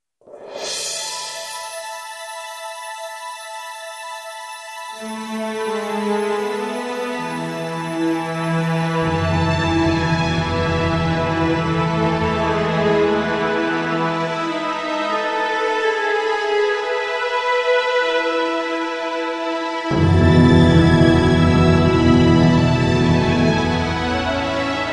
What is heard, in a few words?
Inspirational Golf Commercial